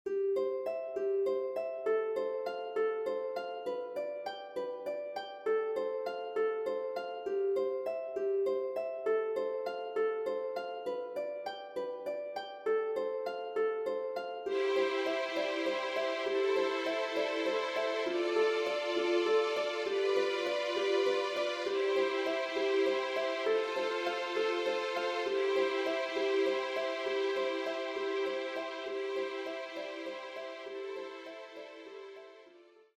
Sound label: skye boat song karaoke